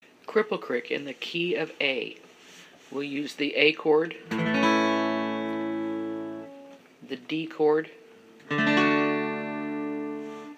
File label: Cripple Creek Guitar Key of A